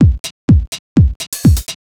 HOUSE BEATS 125

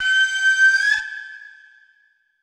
flute, ethno, 160, C

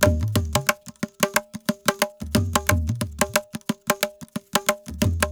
ETHNO PERCUSSION 90; ethno2

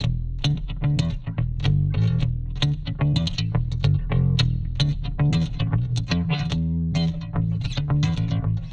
110 E Riffer, Warped Bass; Loops; Touch Loops; CosmicFunk

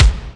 Kick

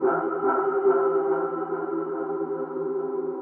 techno pad 140; Techno2; Keys

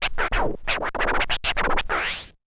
OLDSCHOOL SCRATCH 96

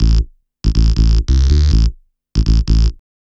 ELECTRO BASS 140 A

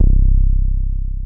WUMM BASS C1; SYNTHBASS

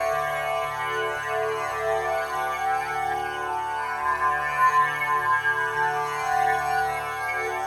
ethno, 125, A

